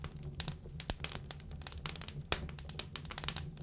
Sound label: fire medium